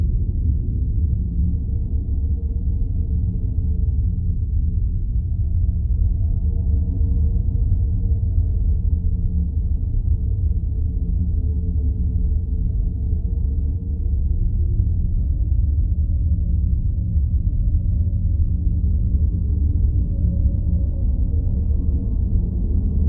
Shaft Amb Loop

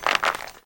Turn

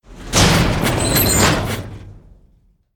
Upper Elev Dock Top

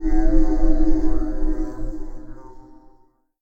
Yeesha Page Glow

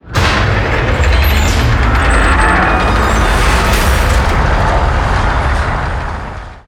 Gear Start

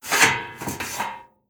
Metal Turn

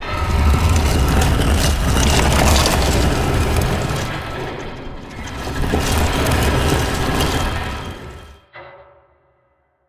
Ball Hall Ladder Conceal; moul

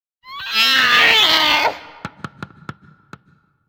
Anim Screech; Urwin; moul

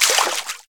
Water Run

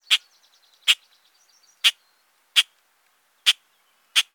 Bird Call

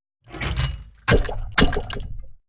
Door Bolt Cl

